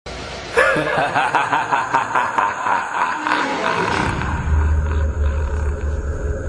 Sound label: Evil Laugh